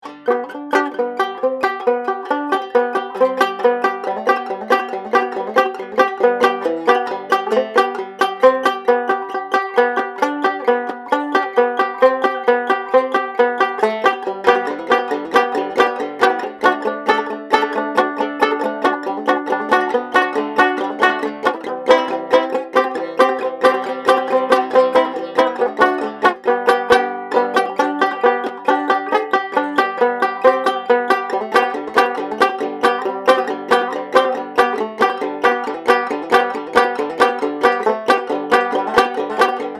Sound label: 17 fret